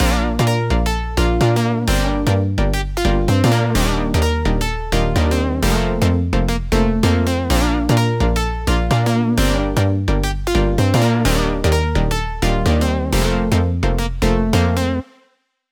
128 BPM Bb Maj, Drop Loops, House; Butter; Cymatics

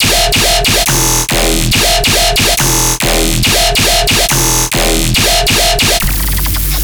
Bass Loop E 140BPM, Dubstep; Moonbows Bass; Ghosthack - AC2024 - Day 13 - Dubstep Sounds 2025

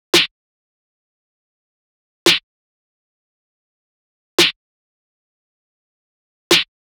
Drum Loop - 113 BPM Snare; Loop Stems; Cymatics; Bermuda Pt 2 Drum Loop

